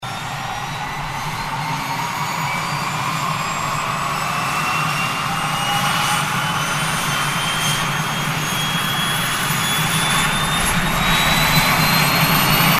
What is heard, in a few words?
jet turbines